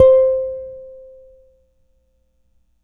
C4, Guitar; Guitar & Bass; Ableton Foundation FreePacks; Jens-Sus

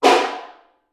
SN3 Far, Snare; Ableton Foundation; Snare Designer

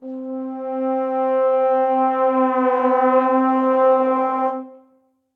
MPE Erosion Pressure, Brass Quartet; Ableton; Factory Packs; Spitfire Audio

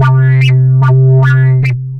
Dirterydoo, Synth Rhythmic; Instruments; Wavetable; Ableton; Factory Packs; Synth Essentials

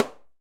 Timbales A H Mute; Latin Percussion; Drum Hits; Ableton; Factory Packs

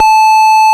Square A4; Ableton Foundation FreePacks; Foundation Glitch Toys & LoFi

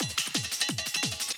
175 D&B Drums; Dance DJ; Drum n Bass

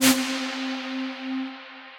Strings, pads; Orchid; Ableton; Skitter and Step

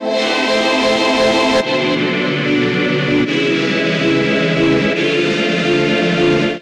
Deep Choral C Major 145 bpm, Pads; Tonal; Skitter and Step; Ableton Factory Packs

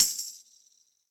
Tambourine Room With A Vista; Drum Hits; Ableton; Factory Packs; Session Drums Studio; Standard Hits

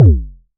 modular deep, Kickbank; Ableton Foundation FreePacks

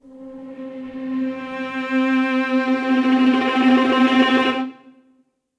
Spectral (Slide) String Quartet; Instruments; MPE; Ableton; Factory Packs; String Quartet by Spitfire Audio

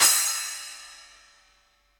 Splash 8 Session Dry, Cymbal; Drum Hits; Standard Hits; Ableton; Factory Packs; Session Drums Studio

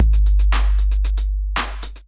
groove 115bpm, drum loops; Neon Midnight; Ableton; Singularities

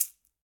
Shaker

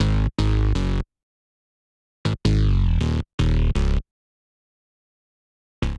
Bass G# Minor 80 bpm; MIDI Clips; Bassline; Modality; Ableton; Factory Packs; Chop and Swing

